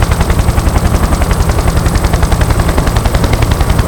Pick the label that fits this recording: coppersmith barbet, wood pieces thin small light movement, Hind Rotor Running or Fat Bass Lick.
Hind Rotor Running